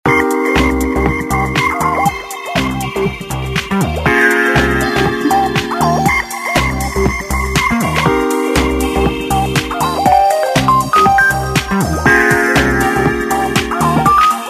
Ringtone, Samsung GT-C3110

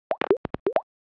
chemical, notifications; Nothing; Phone (3)

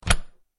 Lock

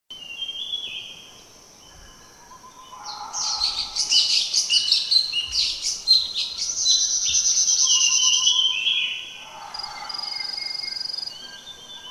Forest, alarms; NGM; Vanity Smart

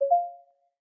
Cable Connect; Rollable LM R910N; ui; LG

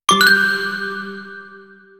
ring ting, notifications; ZTE Nubia Z5

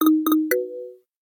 Rain Drop, ringtone; Sharp FX Plus

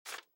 turning page; Samsung Galaxy S8; SPdfNote

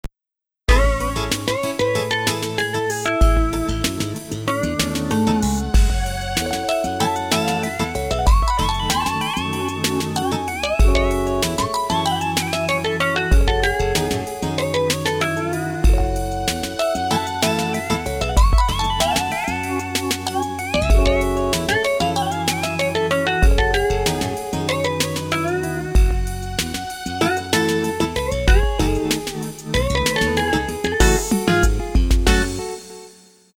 Romantic Twilight; ZTE; C70 Essenze

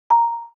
cam timer 1sec; SU370 Optimus One; ui; LG

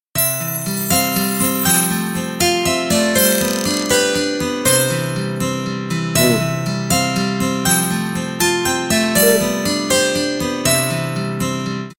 Grass, ringtones; Hisense E602M

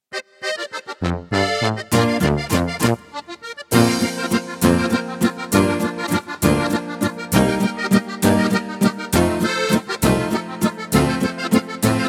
scotland organ, ringtones; ZTE Nubia Z5

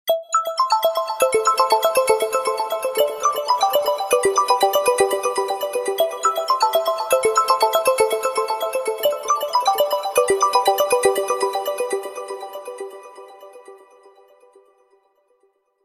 Dreamy Arpeggios; Coolpad; ESurfing-No1-2021

